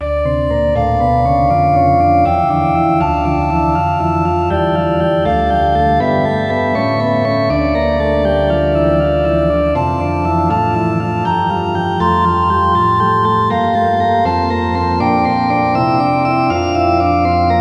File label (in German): o heiland reiss den himmel auf orgel; var 2